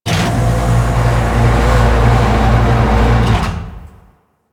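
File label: Cannon Pod Insert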